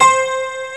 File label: PIANO, HOUSE